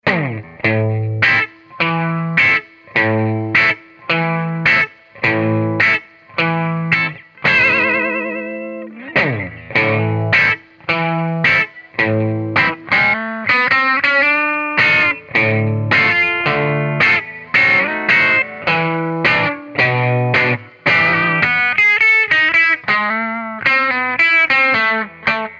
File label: Rattler jaguar; jam pedals